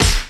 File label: shiny snare one shot f key; snare drum samples